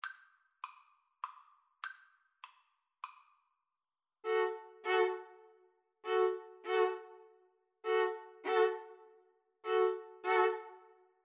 2 Violins Cello; saint saens elephant

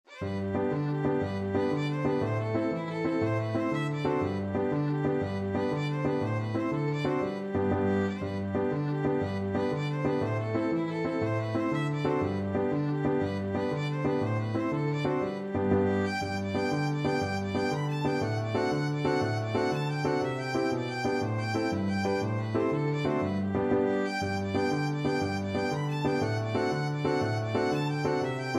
irish jig medley, violin

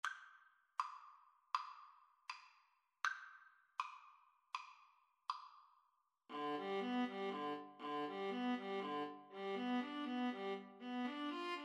ave maria, Violin Viola Duet; schubert

